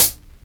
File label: HH, Dance Mega Drums; Best Service; HH-HIP